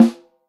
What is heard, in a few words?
phat snare sound A#